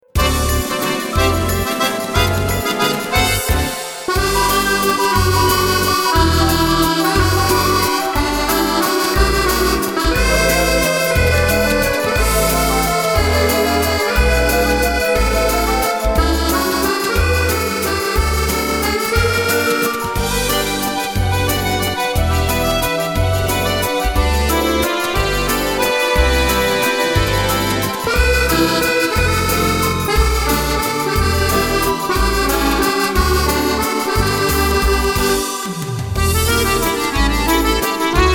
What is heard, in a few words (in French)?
musette